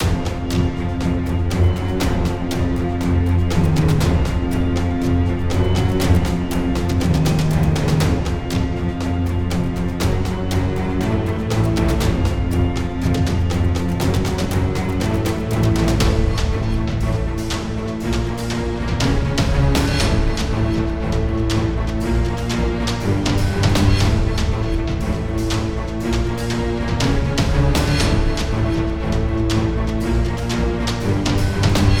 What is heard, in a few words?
Monster Approaching Loop; Lost Ruins